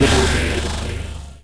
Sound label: bullet explode; EscapeFromCatalunya